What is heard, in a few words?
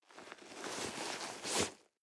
nylon leather soft